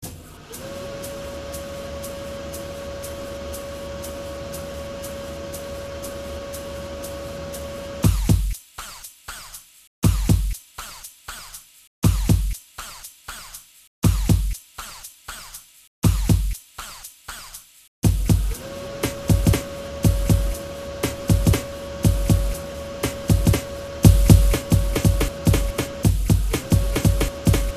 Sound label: industrial dub